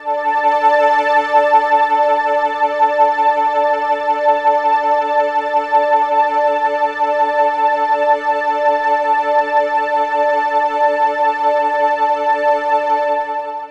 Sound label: Pad